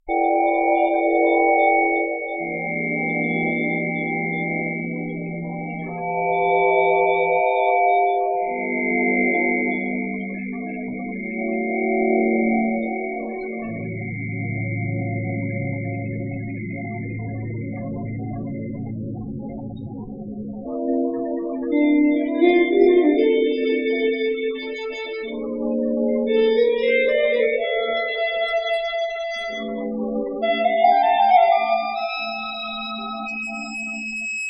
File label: trautonium